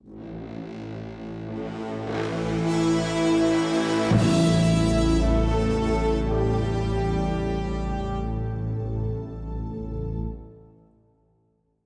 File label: Sting Hyper Jump Triumphant